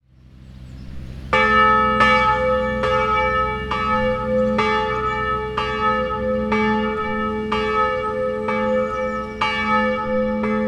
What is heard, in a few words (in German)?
glocke; friedhofsverwaltung